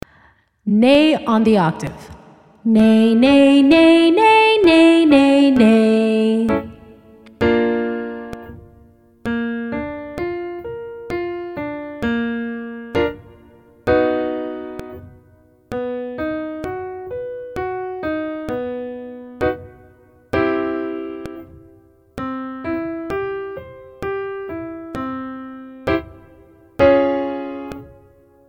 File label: nay octave high range, vocal exercises